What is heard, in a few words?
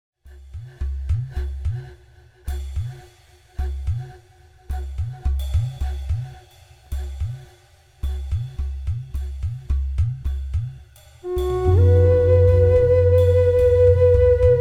flute medicine